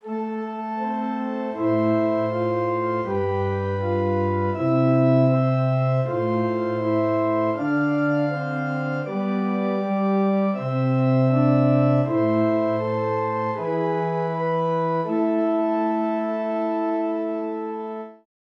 Zwei simmiger Contrapunkt; Ronge; Correzioni di Albrechsberger

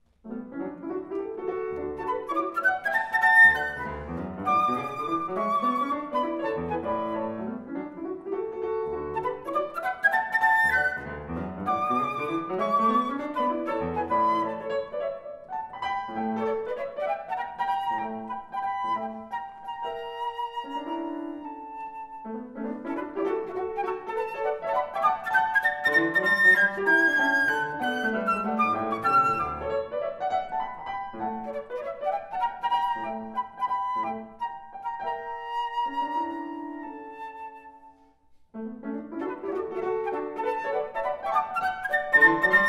Serenade D Dur für Klavier und Flöte, Allegro; nach der Trio Serenade Opus 25; scherzando

